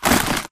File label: hack spectre armorrattle; dataknife; Titanfall 2